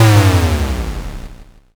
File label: explosion m